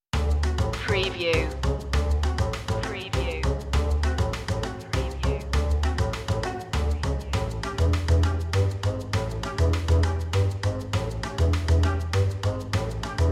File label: quirky moves less bass no piano